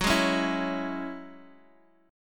F sharp 7th Suspended 2nd F sharp down Guitar Open D